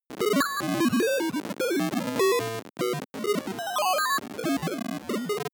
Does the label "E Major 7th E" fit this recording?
No